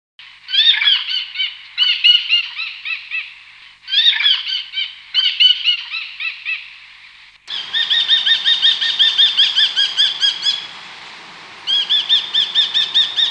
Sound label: hobby australian, bird calls